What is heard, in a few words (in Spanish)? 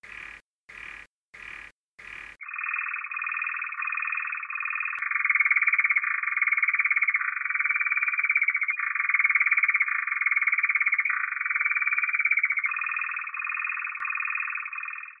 Timbre Profundo; θετικές νότες